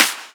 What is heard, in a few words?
Claps; Vengeance - Essential Clubsounds Vol.5